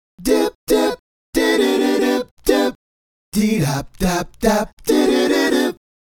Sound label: Male Group, hip hop vocals; Sonic Foundry